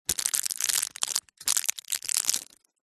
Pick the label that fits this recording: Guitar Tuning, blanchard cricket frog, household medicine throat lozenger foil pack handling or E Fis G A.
household medicine throat lozenger foil pack handling